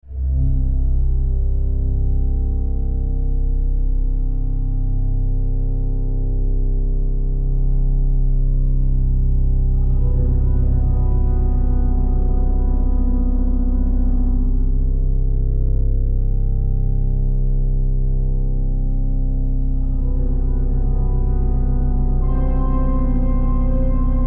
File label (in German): orgelsonate